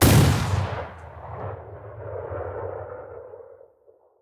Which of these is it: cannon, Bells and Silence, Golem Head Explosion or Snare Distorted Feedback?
cannon